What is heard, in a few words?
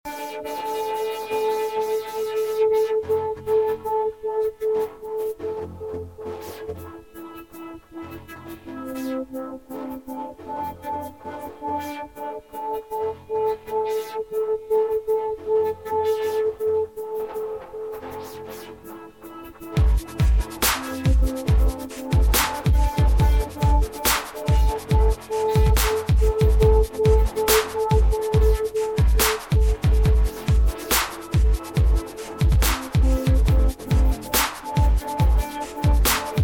ambient drone; noisesurfer